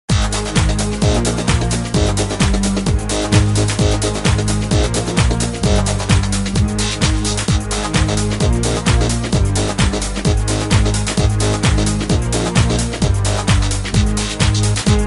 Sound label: Piano